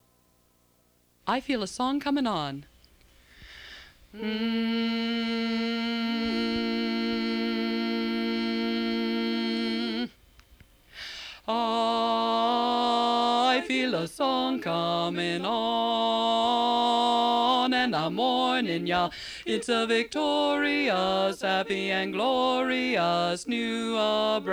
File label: Bass; region17online